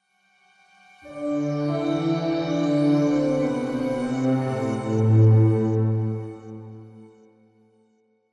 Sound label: capsule sting